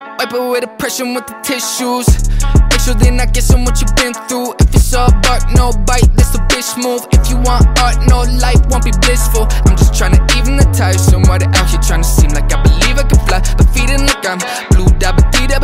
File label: 808s